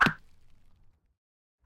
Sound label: arrow wood, attack impact